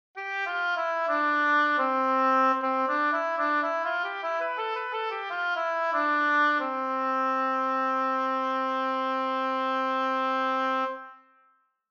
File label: Tempo Change